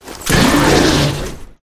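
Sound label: Rat buff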